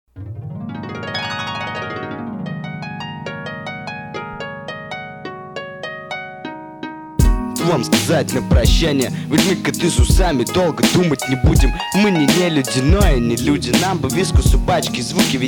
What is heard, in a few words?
jazz duet